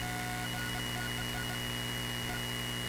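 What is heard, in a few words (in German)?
pc Störgeräusche im Lautsprecher; usb masseschleifen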